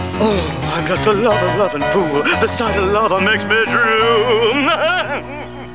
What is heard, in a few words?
voice singing